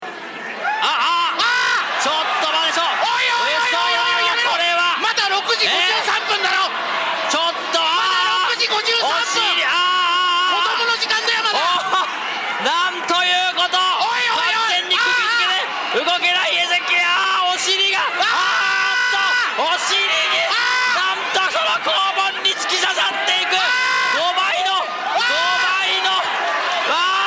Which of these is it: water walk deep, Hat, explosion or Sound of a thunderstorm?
explosion